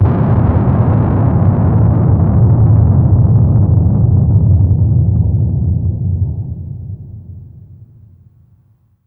THUNDER FUZZ, Impacts, Hits & Booms; Distored Reality

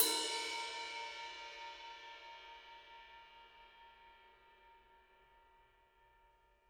RIDE, RIDE CYMBALS; Spectrasonics - Backbeat

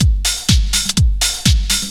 DRM 125, House; Best Service ProSamples vol.10 - House; AKAI

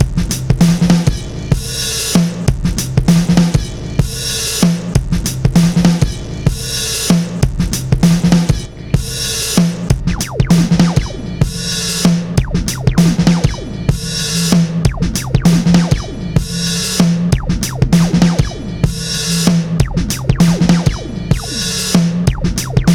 97 bpm, break; CATCH 22; 90 s Sample CDs; Planet of the Breaks

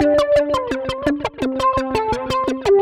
Mixed PWM A 170 D, modular 170bpm; modular madness

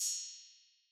ride fx, 138bpm; Zero-G Sound Sense Grime Scene; Construction Kits; Da Suspects

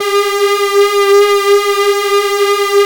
3100 PWM G 5, Korg PS3100; Back In Time Records; Korg Universe VOL-1

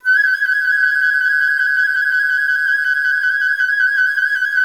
FL TL MI F5, Advanced Orchestra; Best Service; Peter Siedlaczek's Advanced Orchestra

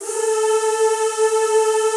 ZZZ A3, Classical Choir; Best Service; Extended Classical Choir

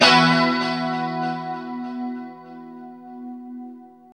2CHD E 4 -L, Guitars; Spectrasonics; Hans Zimmer Guitars VOL-1; JC-SHEEN

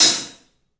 HAT, CLUBHAT, 3D Drums; 3D Sound

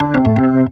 Organ Lick; Ambient Grooves; Organs Licks

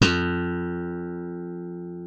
F2, slap thumb; Xsample VOL-1

